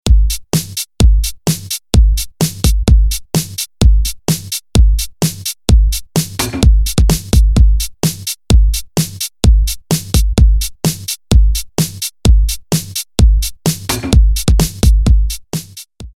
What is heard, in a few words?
beats